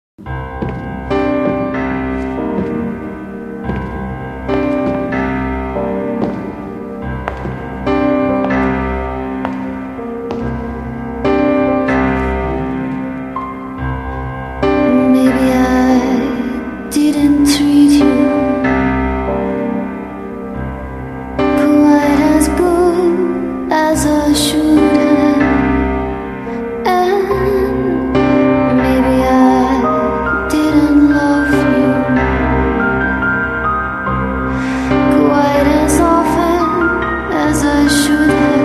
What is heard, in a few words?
(Piano Version); silent hill shattered memories wii gamerip 2009